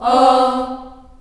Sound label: C3 CHS AH -L, CHILD AHS, Choir; Acoustic & Synth; AKAI; USB Soundscan vol.28